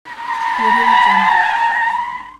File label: auto tires squeal; audio-tree